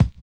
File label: HI RIDER; Spectrasonics; Metamorphosis